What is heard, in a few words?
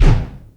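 Kick Ambience, Boss DR660; drum machine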